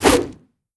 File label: attack whoosh, antlion; creatures